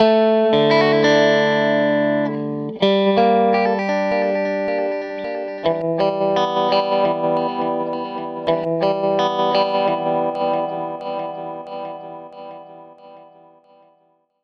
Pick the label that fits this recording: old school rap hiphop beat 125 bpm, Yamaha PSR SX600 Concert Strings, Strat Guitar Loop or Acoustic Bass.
Strat Guitar Loop